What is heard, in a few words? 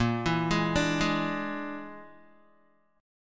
Bb 7th Flat 5th Bb, Guitar; Bb7b5; arpeggiated; JGuitar